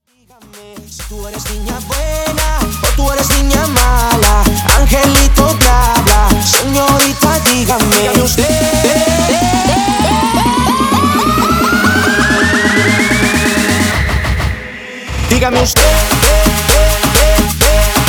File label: tribal remix 130 bpm